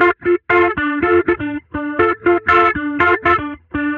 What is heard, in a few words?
Guitar loop 120bpm Dm wet; guitar vibes